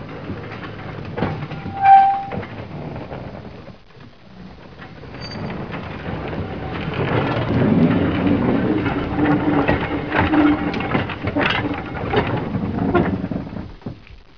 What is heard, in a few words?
vehicle